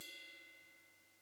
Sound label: Ride